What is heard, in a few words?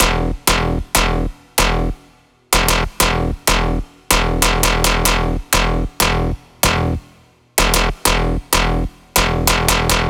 Bass Loop E 95BPM; Dubstep; Dropout; Ghosthack - Advent Calendar 2022 - Day 18 - Bass Loops